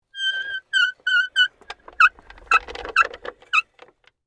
Cart Roll By Slow, Industry, Cart, Roll, By, Slow, Squeaky, Wheels; Rolling